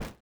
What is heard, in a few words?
step grass